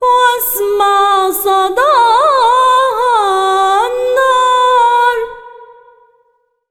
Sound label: SINGER, ArabianTraditions